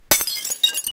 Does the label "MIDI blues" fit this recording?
No